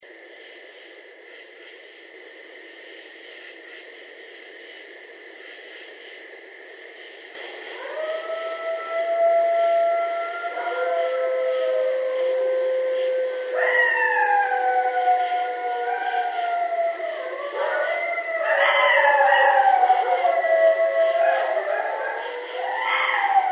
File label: Coyotes; Coyotes (Canis latrans)